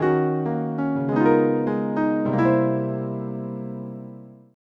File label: Piano, Acoustic Pianos